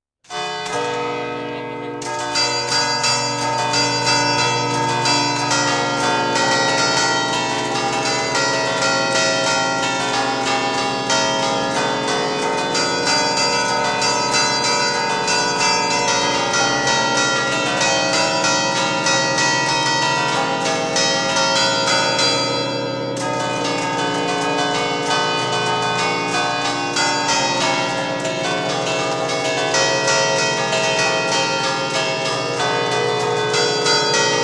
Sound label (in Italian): campane